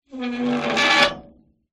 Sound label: industrial lever pull old rusty grind